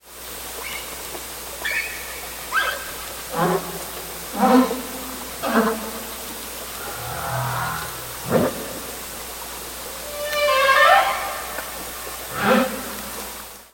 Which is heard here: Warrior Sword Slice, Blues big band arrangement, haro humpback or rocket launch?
haro humpback